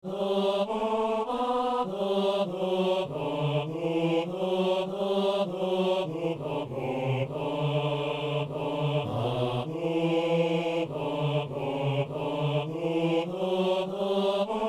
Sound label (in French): basse; Peuple fidèle